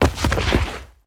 Bodyfall Dirt; SFX Kit; Bodyfalls

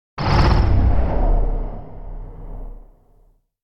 Impact Heavy Destruction Industrial Hit; Hollywood Edge; sound designer's toolkit v2

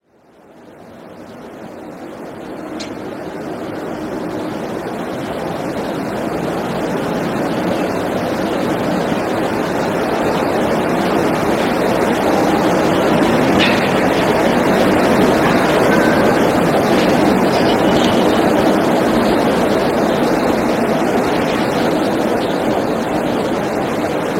stereo street; Pitch Black - A Detective's Demise

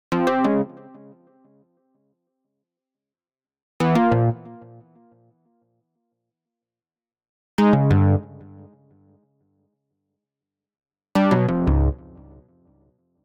Arcade Jingle Negative

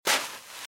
Footsteps - Sand - Dry - Walk - Right

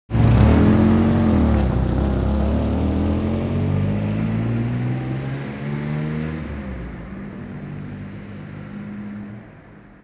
car drives away; best service Blue Box

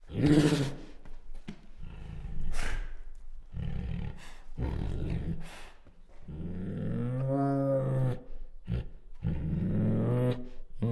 Female Bengal Tiger Growls, Snorts and Whines; Tigers; Sound Ideas

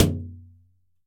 shower, impulse responses; howard fredrickson

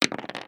dice roll; Dice World

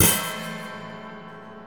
bash, Cinematic Metal Impacts

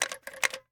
Telephone Check for Dial Tone; Communications; 1001 Sound Effects

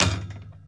Street Ball Wood Back; SFX Kit; Street Basketball

